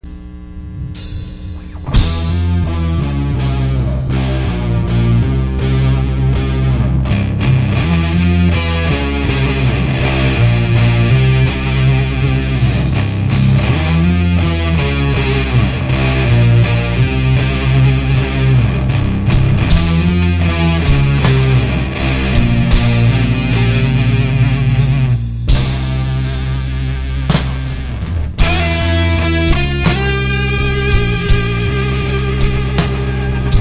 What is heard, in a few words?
electric tone; ELECTRIC AMP INNOVATIONS, LTD